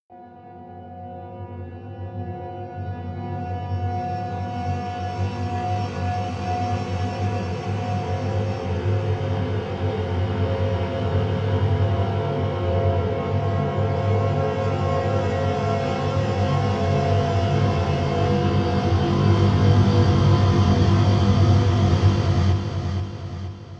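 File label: sounds of endings outro, disappearance fading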